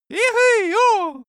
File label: cartoon male cheer